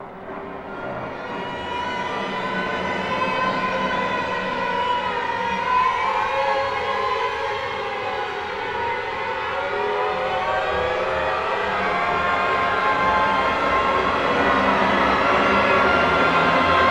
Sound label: STR GLSS, StringFX; Roland - LA Composer vol. 2